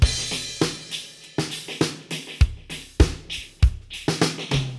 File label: disco fx 100, Beat Loops; Zero-G; Monster Beats